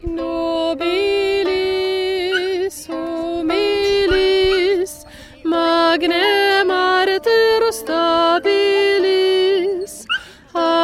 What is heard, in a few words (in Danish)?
vikingemusik og sang; vikingemarked